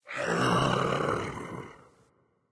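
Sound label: Movement Growl; smite; god bakasura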